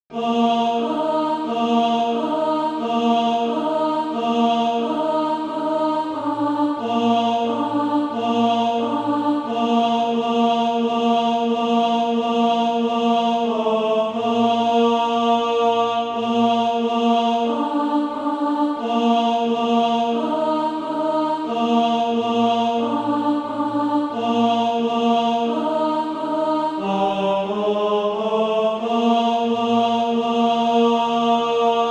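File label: tenor, SATB Practise Music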